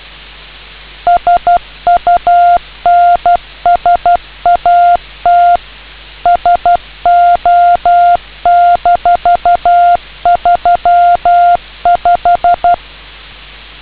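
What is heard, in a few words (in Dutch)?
baken, beacon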